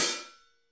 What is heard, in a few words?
HH, Real Kit; 90 s Sample CDs; Sound & Vision - Gigapack I CD 1 (Roland)